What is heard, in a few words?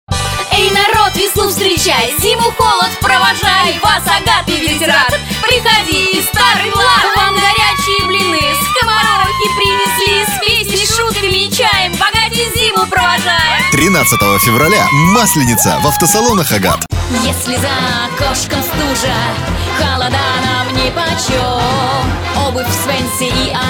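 vocals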